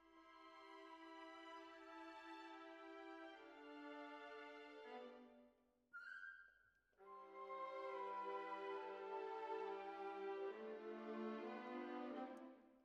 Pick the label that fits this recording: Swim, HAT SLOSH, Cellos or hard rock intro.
Cellos